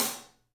SABIAN HAT; 3D Sound; 3D Drums

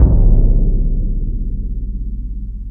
TIMPANI LO

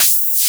noise fx; Masterbits; Switch FX

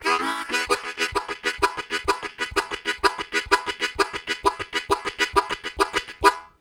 Chuckadee, Harmonica, Riffs; Zero G

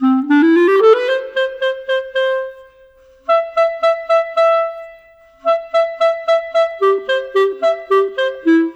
Clarinet, Cinematic; Sonic Foundry (Sony Creative Software); Orchestral Series VOL-3

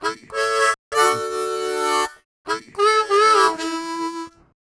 Harm 098bpm A, Harmonica

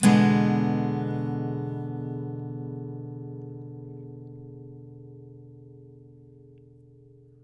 Blues Guitar, BARI CHORDS; ILIO; Fretworks

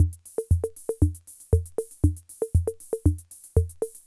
Loop, classic machines, rhythm77

